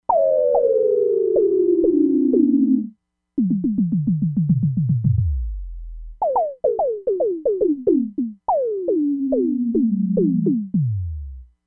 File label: ELECTRONIC TOMS, Crumar Bit 99; Factory Programs